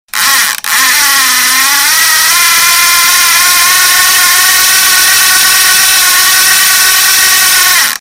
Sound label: Shimano Tld Clicker